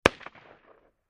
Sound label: M-16 Long Barrel Machine Gun Single Shot From Distant Point of View